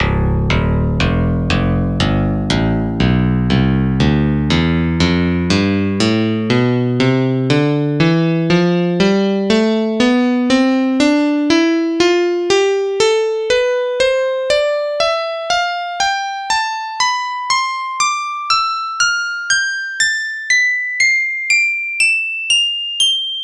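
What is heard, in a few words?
electric piano scale; SpectMorph Evaluation #4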